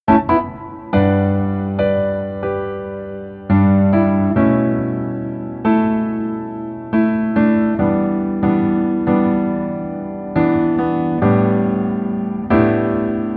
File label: Piano ver